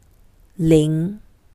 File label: small bell